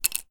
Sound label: plectrum down on surface